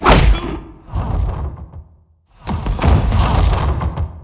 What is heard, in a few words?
punch; Mafie 1 cz